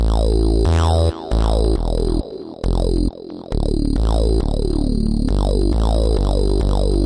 136 echoey filterbass melody, synth and electronic loops